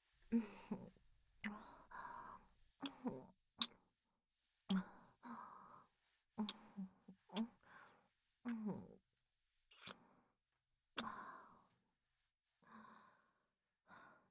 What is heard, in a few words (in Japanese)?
耳舐め手コキ; 勇者ごっこで魔王に勝利♡